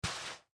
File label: Footsteps Work Boots Single Step Left On Sand; Foley; Footstep Sound Effects